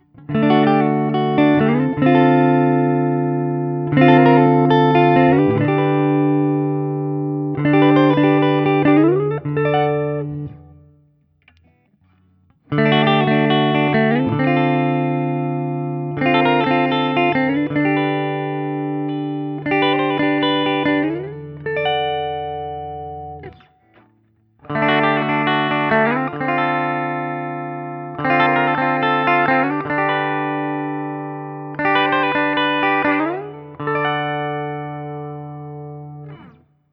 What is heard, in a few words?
Guild X175 ODS100 D; 1983 Guild X175 guitar; blonde jazz box